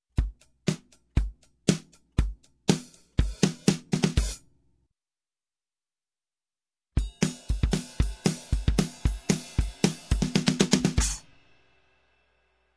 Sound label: Drums rock Bridge, Music, Percussion; Sound Ideas 2000 Series